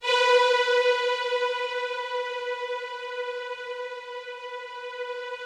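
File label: rough strings B3 left, Yamaha VP-1; Music Store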